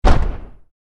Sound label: door slam